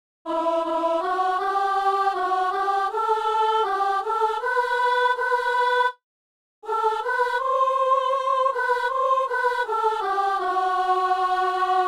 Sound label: soprano; Psaume 71; Messe du 17 janvier à Saint-Denis